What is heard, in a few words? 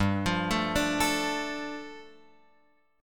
G 7th Suspended 2nd G, Guitar Open F; arpeggiated; JGuitar